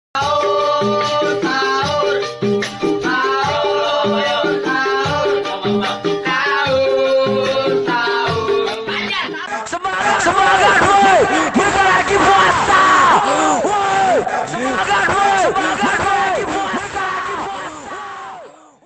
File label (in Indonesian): Ringtone Sahur Untuk Alarm